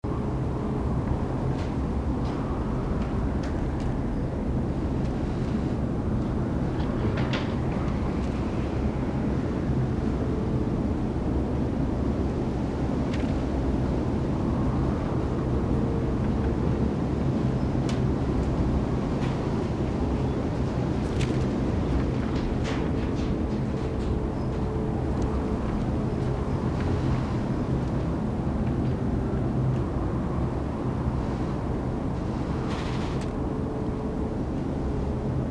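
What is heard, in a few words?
Ambience Garden Workshop Interior Draft Loop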